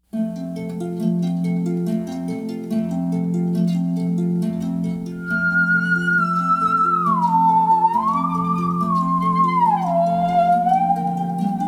serrucho

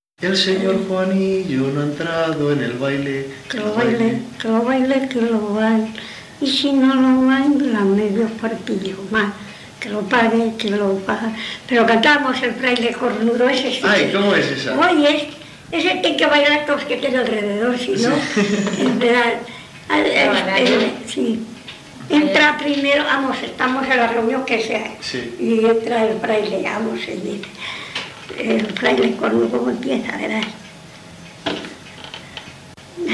Jerigonza de boda de Olmedo

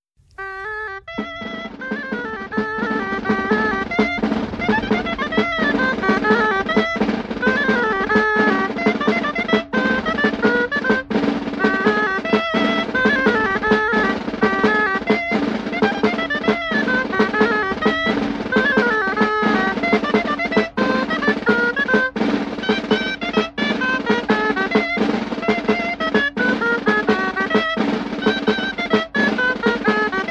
Baile asentao; Baile asentao de Jesús García

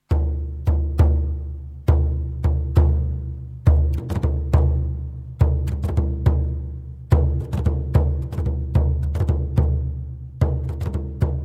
pandero

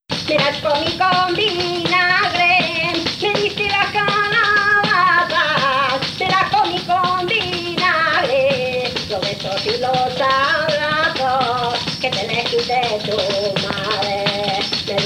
Jota con pandereta; Fresno el Viejo

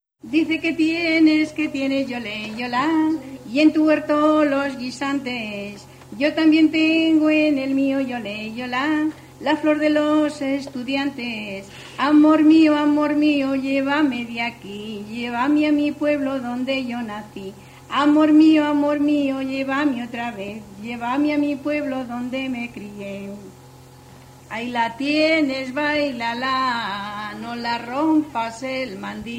Jota San Miguel del Arroyo

